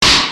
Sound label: battle; maoudamashii